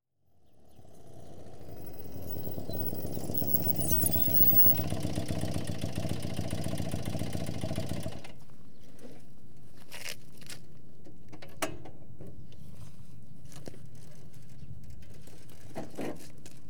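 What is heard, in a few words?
Motorcycle, BMW, Approach And Stop; Transportation; BBC Sound Effects Library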